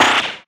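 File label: impact bullet generic titan shield; Titanfall 2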